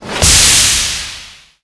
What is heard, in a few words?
Rich Die; Eft